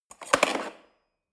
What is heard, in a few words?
cutlery fork drawer handle scrape